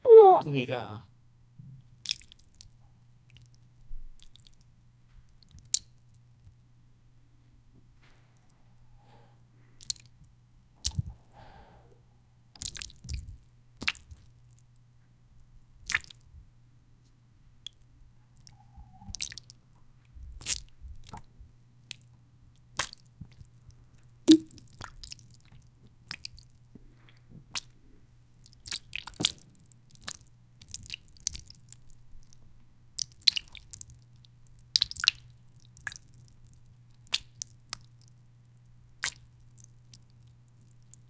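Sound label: messy soft diarrhea leaking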